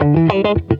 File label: LICK, Funky GtrLicks; E-MU Formula 4000 Series Vol. 1; Hip Hop Nation